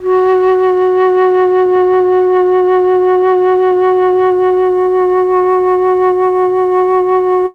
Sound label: flute; ILIO; Propeller Island Legendary M400